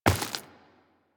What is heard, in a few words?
Step Flesh, Footsteps Walk; Warframe; Tenno